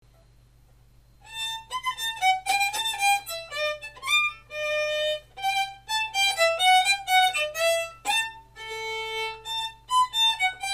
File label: gavotte